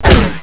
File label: claw strike